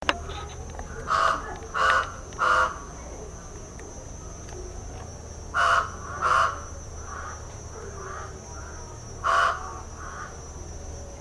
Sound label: Pied Crow